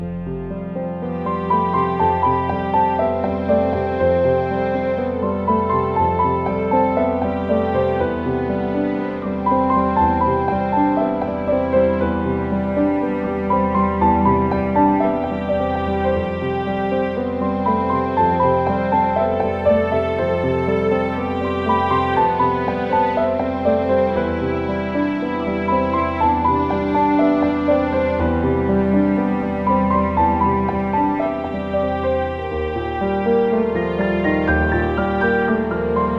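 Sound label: beautiful Piano; Castle on the Coast; PS4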